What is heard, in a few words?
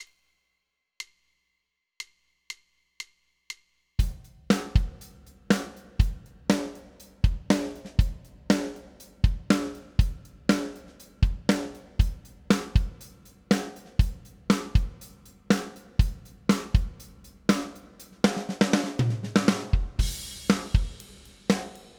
Drums 120bpm